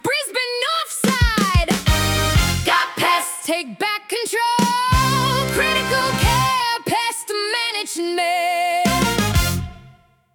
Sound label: Stab